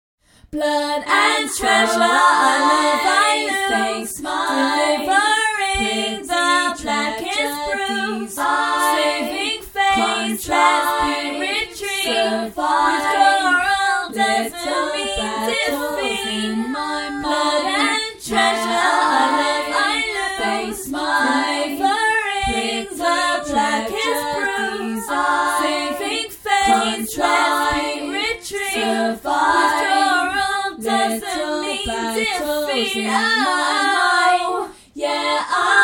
outro vocal layers

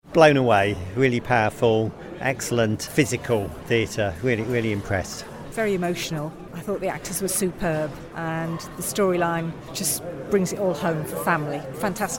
audience reactions; the fisherman

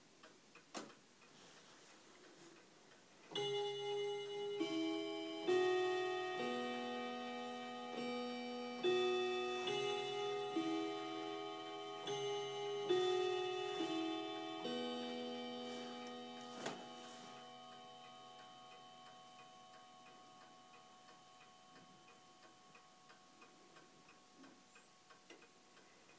short chime

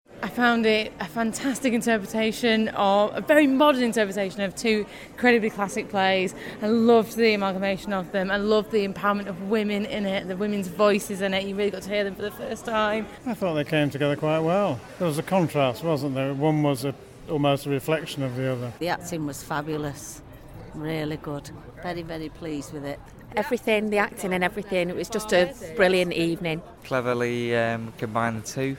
othello macbeth audience reactions

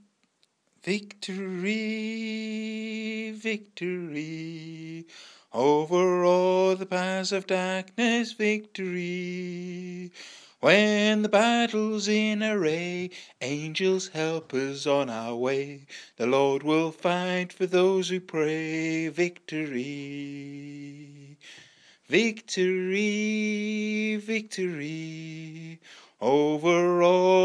victory

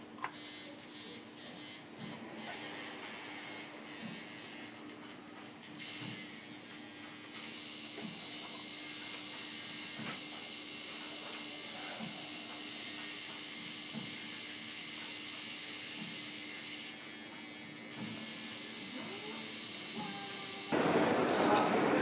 the sound of tattooing

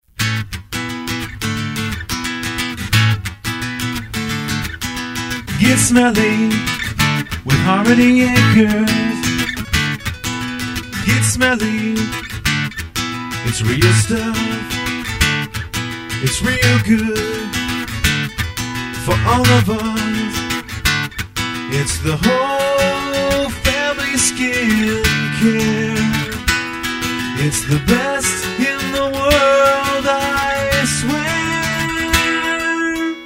jingle

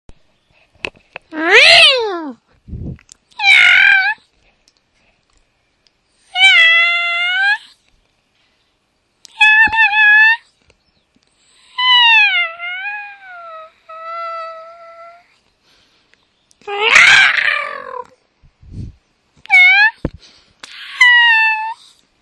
cat noises

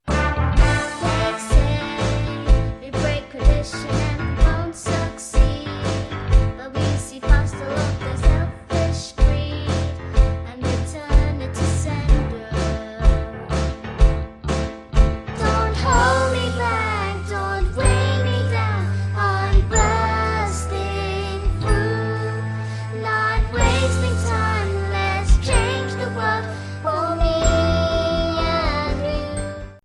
primary school sing; gaywood

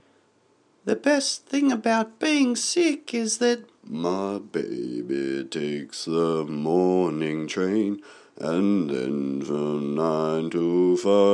singing

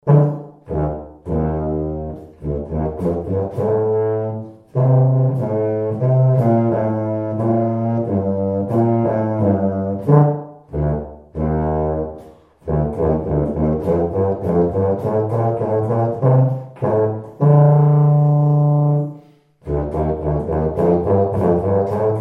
tuba

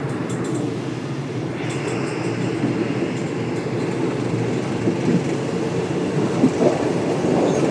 conductor ticket clicking

